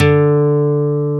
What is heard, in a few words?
D3, Acoustic Guitars; AKAI S6000 CD-ROM - Ultimate